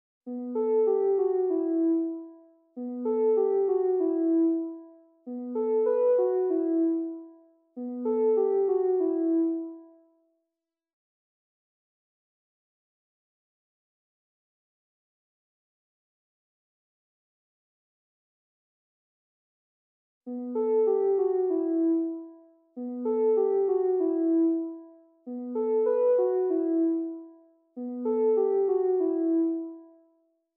Synth Lead, 96 BPM E Min; Cymatics; Infinity Melody Collection; Avarice

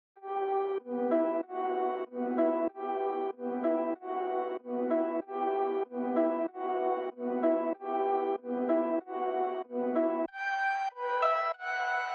95 BPM E Min Halftime Keys; Melody Loops; Cymatics; Hip Hop Sample Pack; Perro

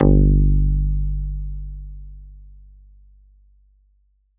Synth Shot G Slap; Trap and Dubstep; Synth One Shots; Ghosthack; Free Trap and Dubstep Samples 2018

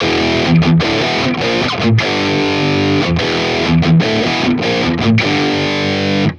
Metal Guitar Loop, 150 BPM E Maj; Cymatics; Vibrations Guitar Sample Pack

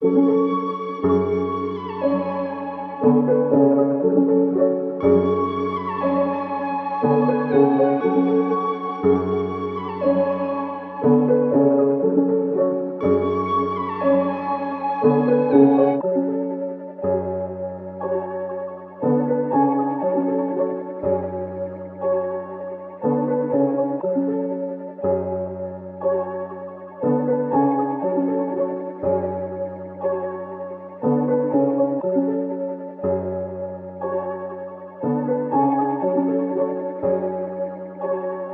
120 BPM F# Min; Cymatics; 2022 Melody USB Expansion

